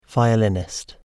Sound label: violinist